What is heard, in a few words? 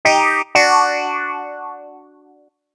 Lose Point; KQ Spring